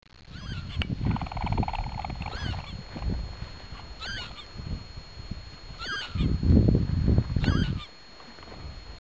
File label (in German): Ruf des Kiebitz